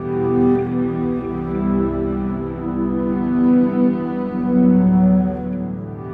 Acoustic Drone, Long Loops

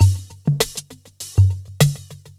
drloop, drumloops, Vinyl Attack; Masterbits

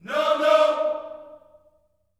NO-NO, Gospel; Spectrasonics; Vocal Planet; GOSP PHR

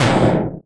PUSH, Anime Sound FX; AKAI Anime Sound FX

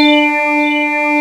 SWEEP WAVE; SAMPLE WAVE MIXING 1

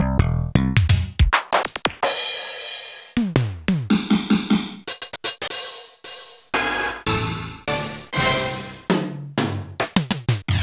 Kawai R-50e

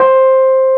AMB ROAD, Keyboards Old School

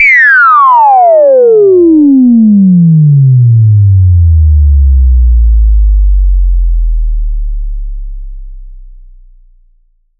SYNTH FX

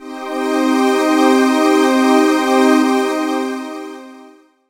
pad, Dance Synths

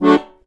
HOHNER, akkordeon

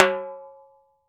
H-TIMBA RIM, TIMBALES

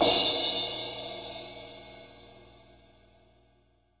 CRASH, percussion reverb; MARKYDRUMS

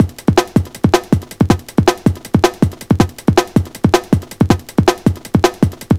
160, Drum Loops, Drum & Bass; Total Drum&Bass

